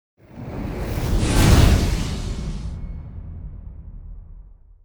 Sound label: Book